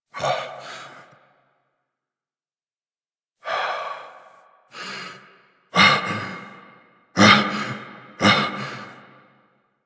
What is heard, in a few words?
Breaths; Kahl; Warframe; The New War; Grineer Eidolon Ending